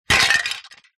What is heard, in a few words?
impacts plastic thin flimsy hit ground break smash